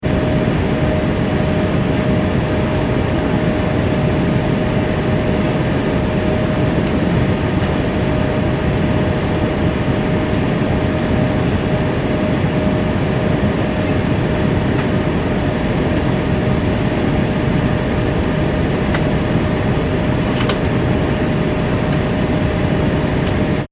transportation aircraft commercial airbus a340 interior, In-Flight Ambience; Airplanes; Vehicles